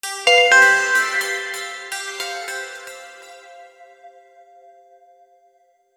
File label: game sound the use of, alchemy